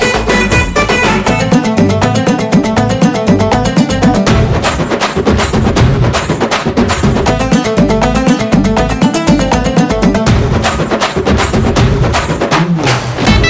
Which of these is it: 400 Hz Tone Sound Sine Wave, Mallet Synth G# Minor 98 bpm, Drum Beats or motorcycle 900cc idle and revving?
Drum Beats